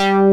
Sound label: SHARP BASS; Pro-Rec - Synthsonic; BASSES